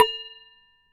Damped Hit, Glass, Large Wine Glass

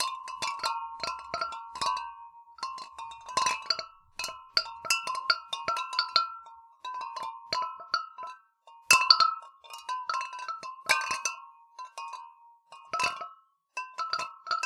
Water Clinks Mono, Glass Clink In Water

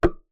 Key Noise, Melodica

